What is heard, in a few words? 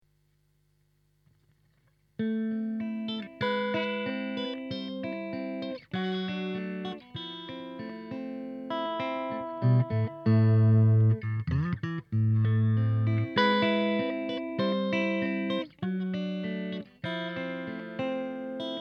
Pentode clean; lampes